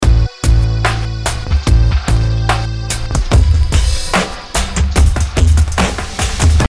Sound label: Loop Dry